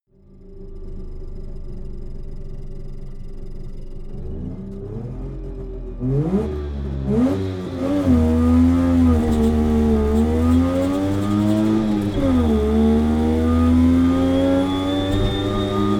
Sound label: car saab 92 drive interior